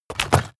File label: mechanical lever stone small up